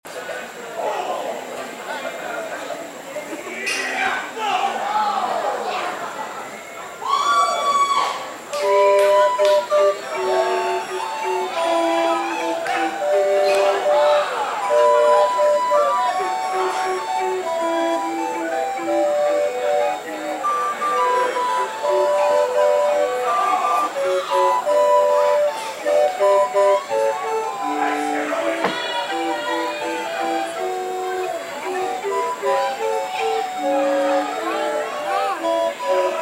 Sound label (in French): flutes a bec